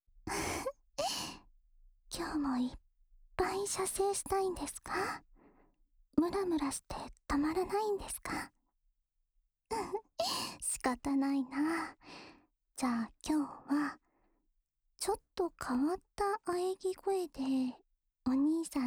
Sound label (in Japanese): オナサポ用にゃんにゃん喘ぎ声（右耳）, ASMR